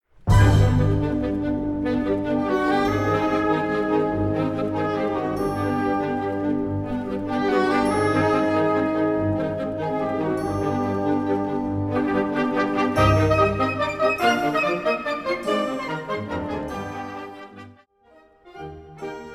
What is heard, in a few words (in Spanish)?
Preludio y pasacalle, para banda; AGUA, AZUCARILLOS y AGUARDIENTE